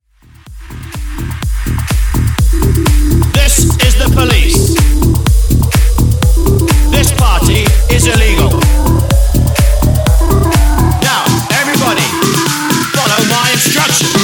House 125 Bpm; Mashup

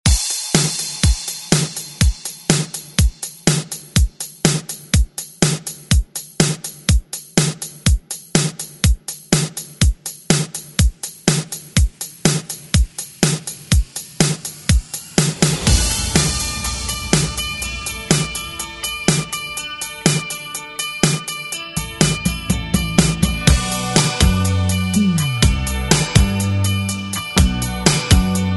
80s ReDrum

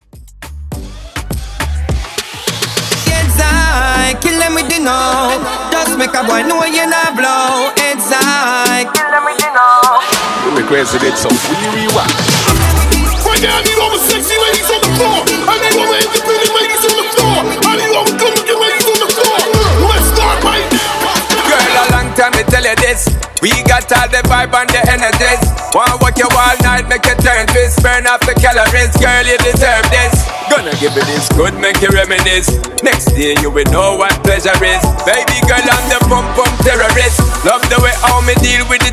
Mashup Hype In Outro 102 BPM

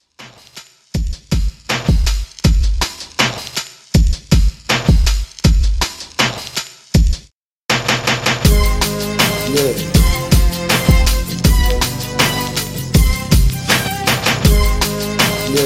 Old School Redrum 160 Bpm

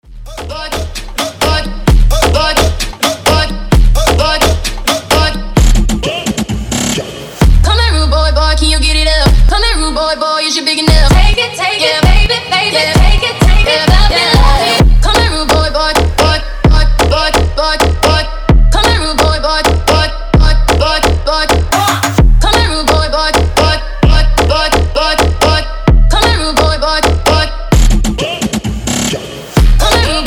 baile funk breaker 130 bpm

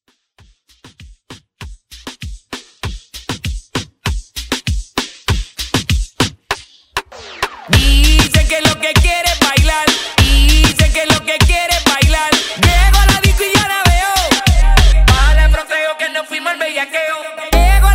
Reggaeton Redrum

